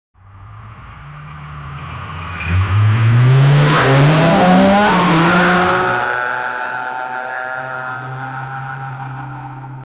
car starting sound distor; distortion

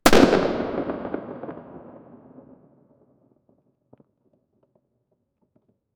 cracking wood crate explo

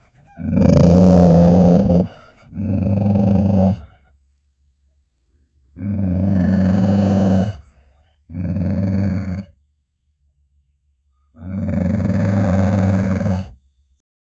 a big dog growls and

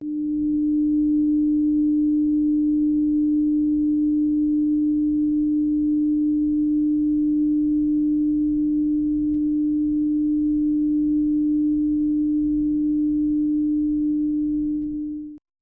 portal sound magical howl

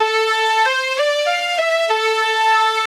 success melody

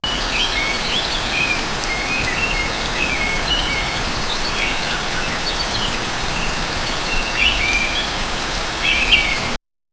only a nature sound of, rain

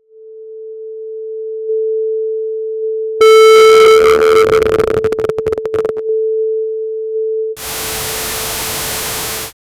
portal teleport, magic

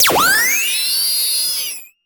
futuristic laser electro

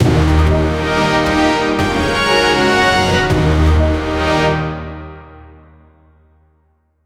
imperial fanfare

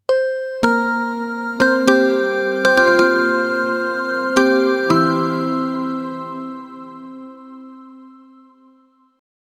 windows startup

